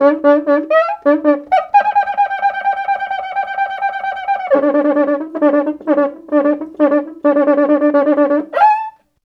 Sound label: 130 bpm, Rio batucada